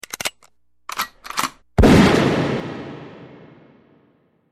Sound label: Gun Loaded Cocked and Shot